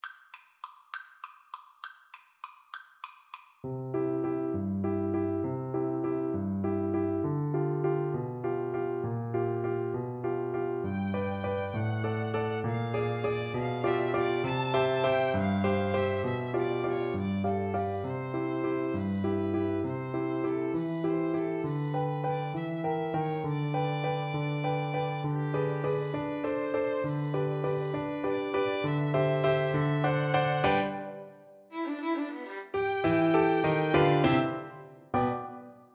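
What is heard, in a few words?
waltz, flute violin karaoke; Violin-Flute Duet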